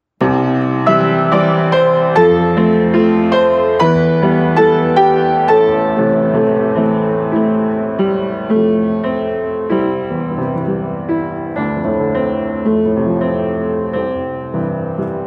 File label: piano